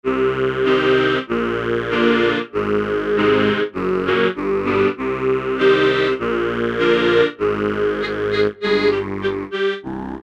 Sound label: Pad Vocoded, Alesis Micron; Factory Programs; Vocoded Ents